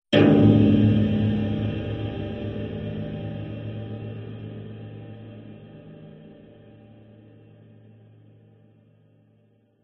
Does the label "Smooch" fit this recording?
No